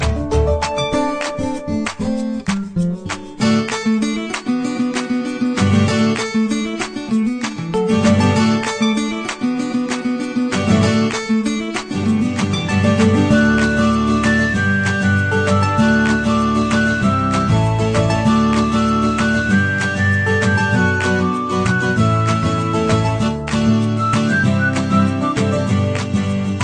Ad Music; Samsung Galaxy Note 2

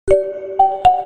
Notification Ringtone; Vivo Y200

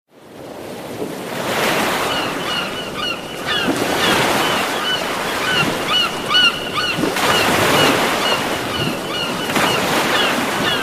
Samsung Galaxy Note 2

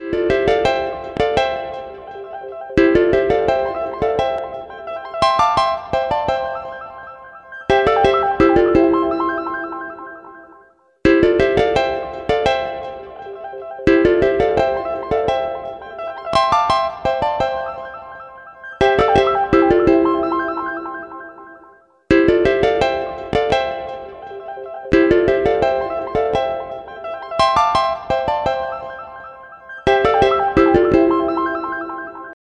Samsung Galaxy A05

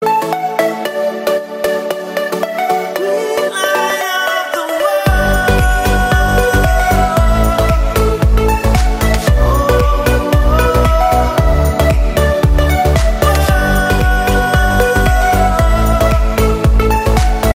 Samsung Galaxy Z Flip 4

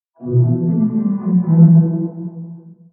Notification Ringtone; Vivo S18E

